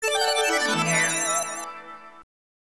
ROUND CLEAR; Bubble Buster

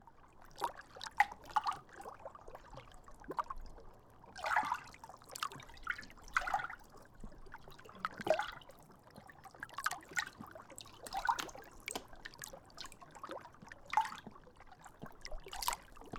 Mountains Water; A Difficult Game About Climbing

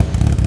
BIKEIDLE; Jeff Wayne's The War of the Worlds

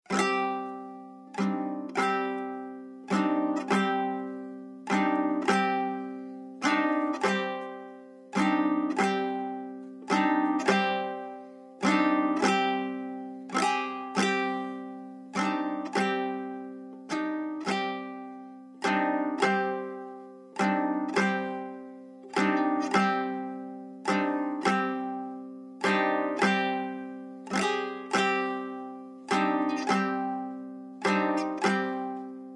bard kitara; assassin s creed valhalla

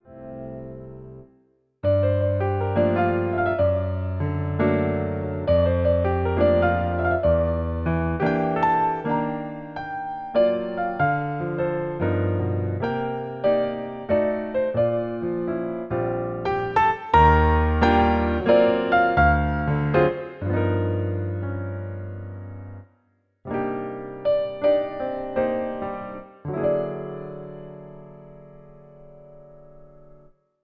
Piano; Trails in the Sky 1st Chapter

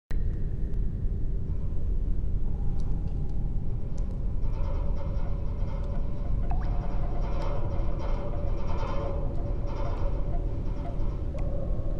Catacombs Amb; Volkolak The Will of Gods

